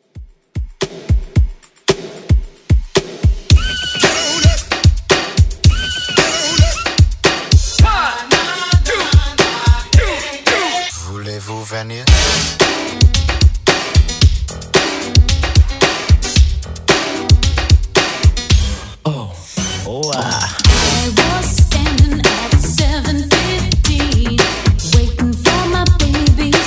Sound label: 80s DJ Friendly Redrum 112bpm